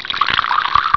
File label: Water; ST-XX Amiga Soundtracker Sample Packs